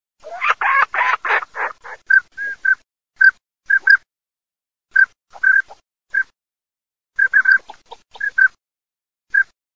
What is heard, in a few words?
maicero, birdsound